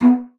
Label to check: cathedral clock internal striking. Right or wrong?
Wrong